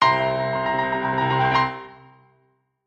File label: Dramatic Chord; baron fur is gonna fly